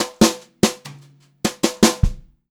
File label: JZ FILL, Brushed Drum Loops, JAZZY; USB Soundscan vol.39; AKAI